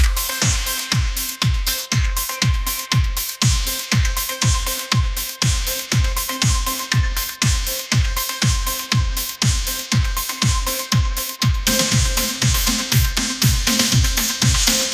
upbeat pop melody

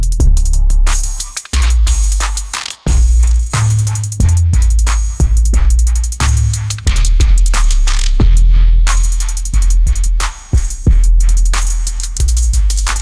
shadow alley trap beat, ringtones

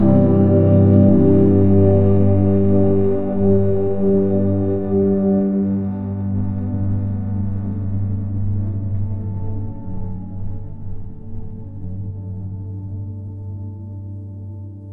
ambient soundscape; ai

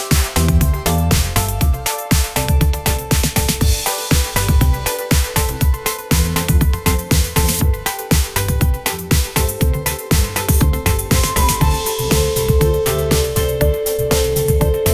upbeat pop melody, ringtones

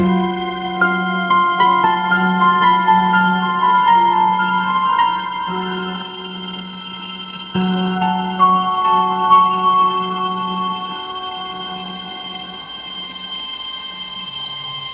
orchestral strings, ringtones; ai-generated

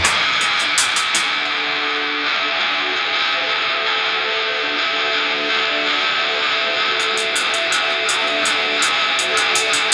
heavy distorted electric guitar riff

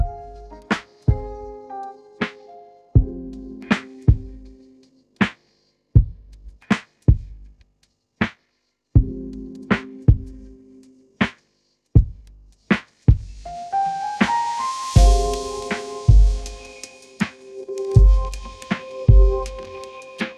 mellow piano lofi; ai-generated

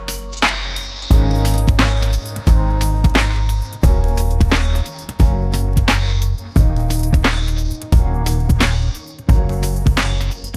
lo fi hip hop

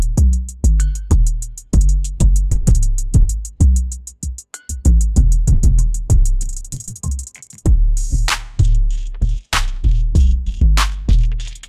funky bass line

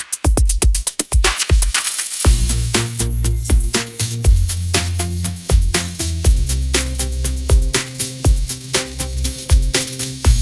upbeat pop melody, ringtones; ai

